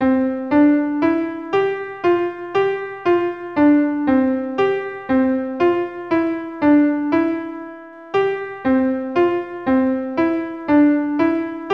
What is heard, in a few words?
piano